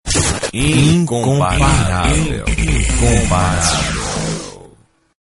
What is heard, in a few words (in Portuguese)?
vinheta